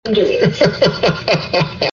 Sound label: laugh